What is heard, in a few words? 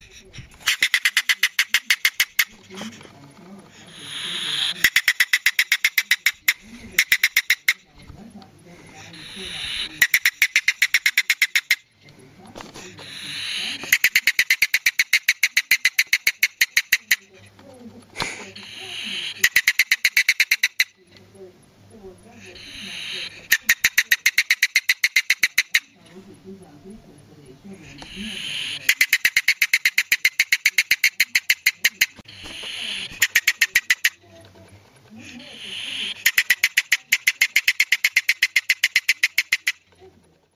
marbled polecat